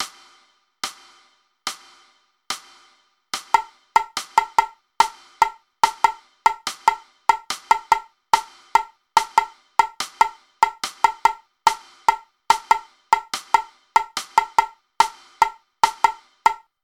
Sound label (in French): tamborim lent